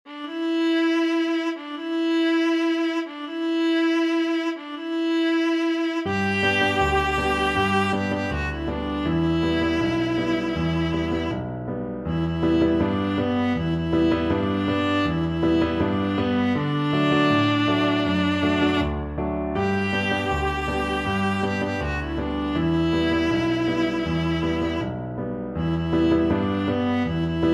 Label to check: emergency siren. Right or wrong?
Wrong